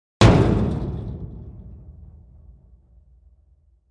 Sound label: Industrial Waste Removal Bin Hit with Sledge Hammer